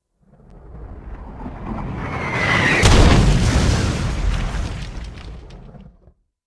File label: airstrike; ambience; svencoop; urbicide